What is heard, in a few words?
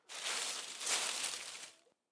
Bushes; we are the dwarves gamerip 2016